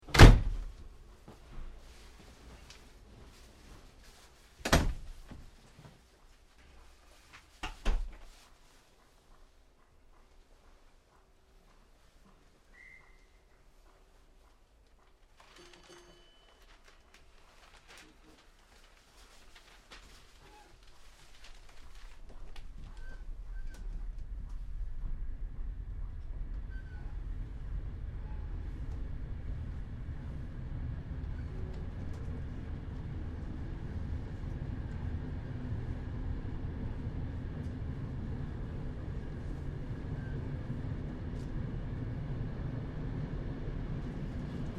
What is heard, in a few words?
Train Electric Interior in Station then Departs; Door slams - bell - interior travel; Train Sound Effects